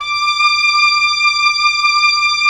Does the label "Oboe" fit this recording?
Yes